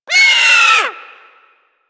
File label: Sea Bird Vocal Death; Warframe; Hunting Critters; Ostron Sea Bird